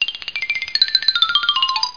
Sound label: RUN